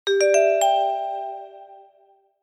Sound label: notification agent assignment